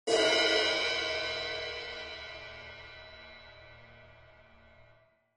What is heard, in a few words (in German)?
Becken; unmittelbar aufschlag; Instrumentenkunde